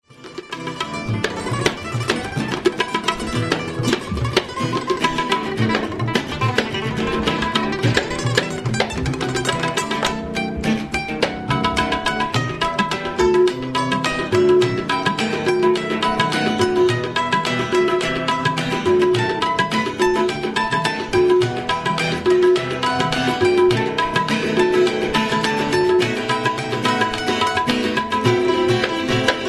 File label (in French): lahore guitare elec